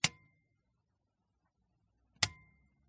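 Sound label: typewriter lever; mkh8040; PMSFX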